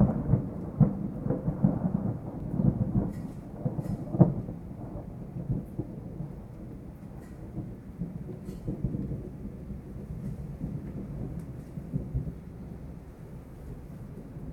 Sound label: outdoor